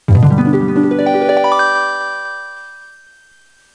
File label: harp